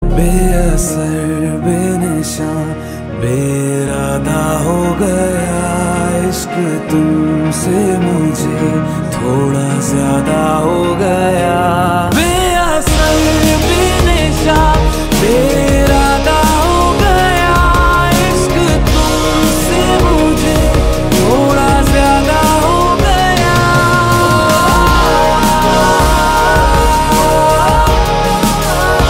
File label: Ringtone